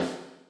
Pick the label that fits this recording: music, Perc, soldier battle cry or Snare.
Snare